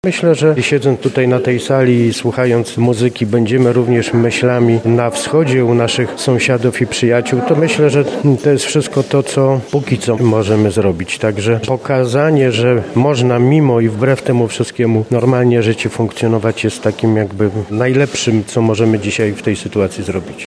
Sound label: pian